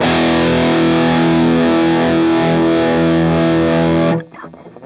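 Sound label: fuzz guitar vibrato; MAYFUZZ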